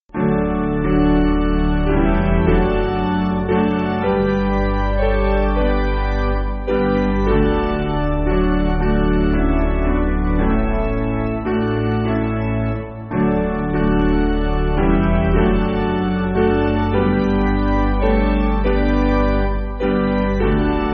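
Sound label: Piano, Backing Files for Churches; Sweet hour of prayer! sweet hour of prayer!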